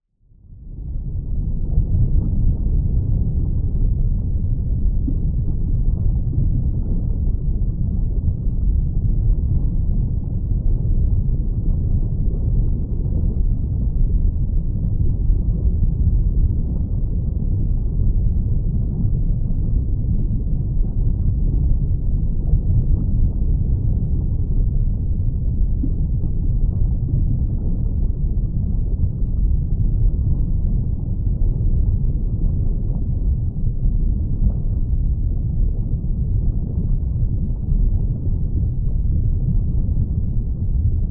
nature water underwater deep bubbles ambience; zapsplat

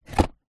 food egg cartoon cardboard close; zapsplat

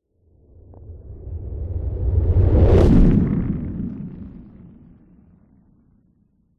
sound design whoosh fast light rumble rocks; zapsplat

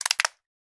horror guts bloody splat squelch in plastic bowl; sound effects one

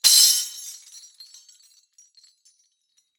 Chains pitched Chains Hits; Just Chains; soundbits

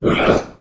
Demon; little robot sound factory

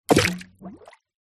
nature water plop splash

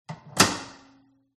close microwave

